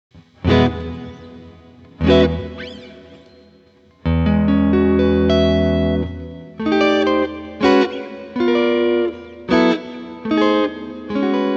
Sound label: Guitar Hall, AXE FX 3